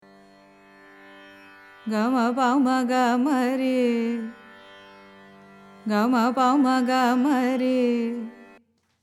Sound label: chalanattai, carnatic music